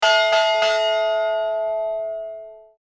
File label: bell